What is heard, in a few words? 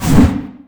pre attack; weapons; dota; huskar